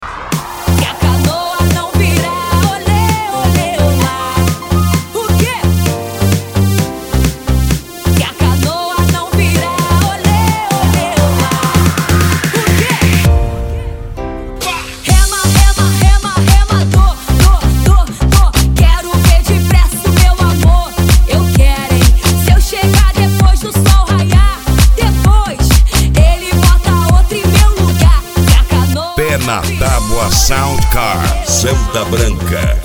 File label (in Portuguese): sound car carnaval